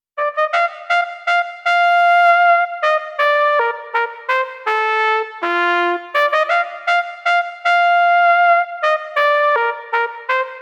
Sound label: Trumpet Bb, Aerophone AE-10